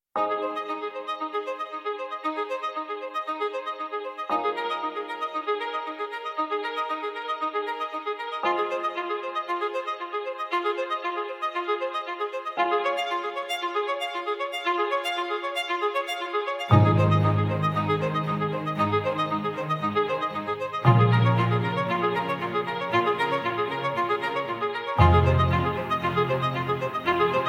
Inspirational Speech Background Music for Presentations; e-soundtrax